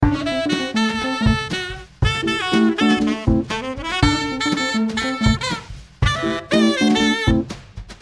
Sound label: Romentic Saxofone